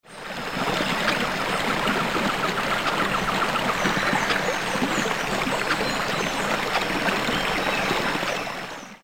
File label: Jungle Stream with Birds; Tropical Rainforest